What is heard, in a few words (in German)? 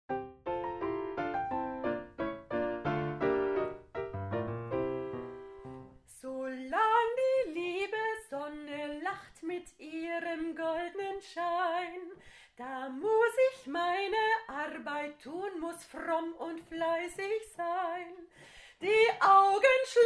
Playback Nur Gesang; Koessler